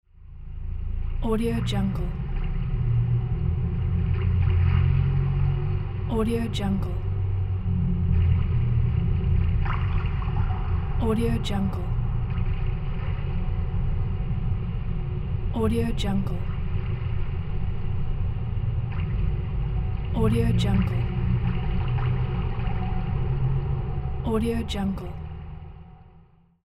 horror background ambience

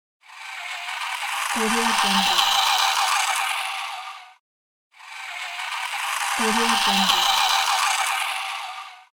toy car

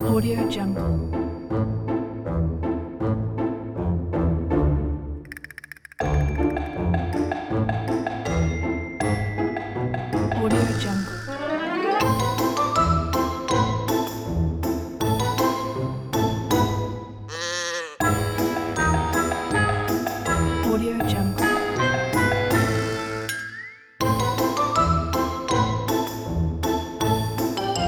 russian comedy cartoon

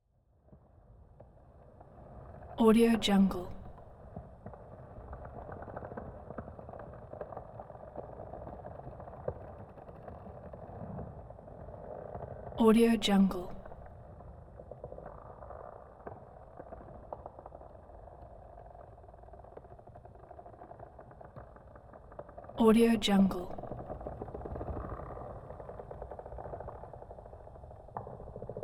giant vine movement continuous creaking swaying

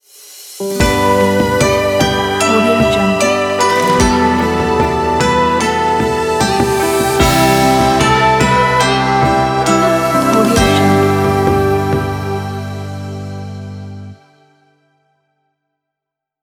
chinese smart logo

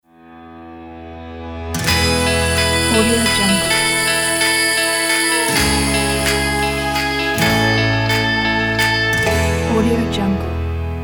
corporate logo

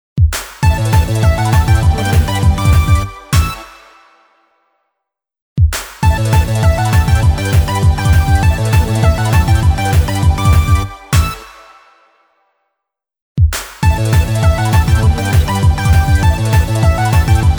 happy pop animation jingle logo

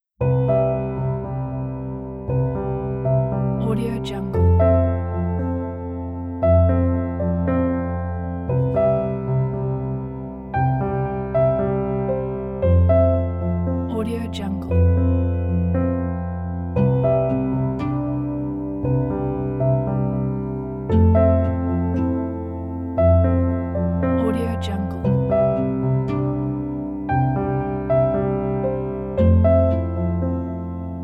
beautiful pure soft piano

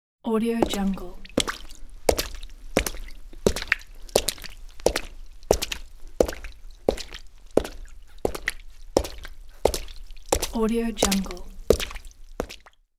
footsteps on puddles

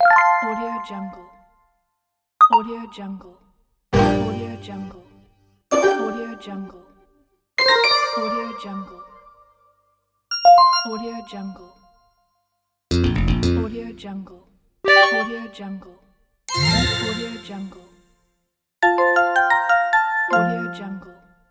pc menu fx

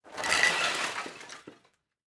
wood sticks fall scrape on concrete; matter mayhem; soundmorph

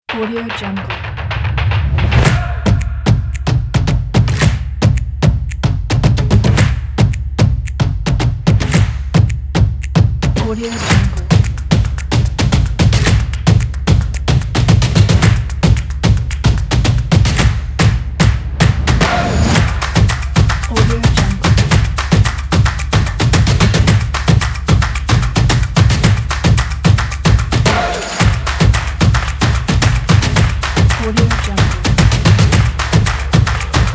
drums

